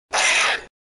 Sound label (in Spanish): Grito